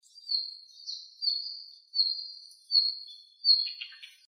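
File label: ruribitaki call, red-flanked bluetail; jinaki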